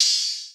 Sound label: Open Hihat; Cymatics; Eternity Sample Pack; Drum One Shots; Cymbals